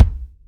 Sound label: kick one shot b key